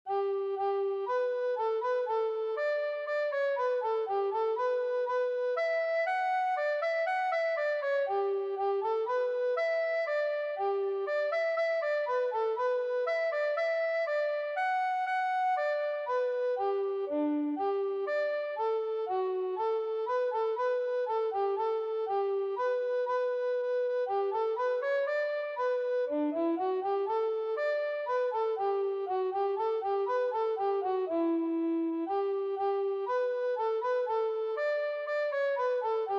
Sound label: Goddess Tenor Recorder